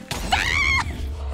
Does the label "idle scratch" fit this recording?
No